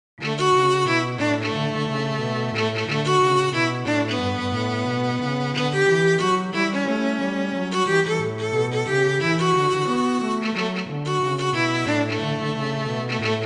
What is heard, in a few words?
Jazz Xmas Song Jingle Bells; String Quartet; Pierpont, J.